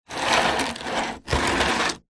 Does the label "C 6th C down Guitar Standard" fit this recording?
No